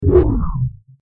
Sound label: EM Pulse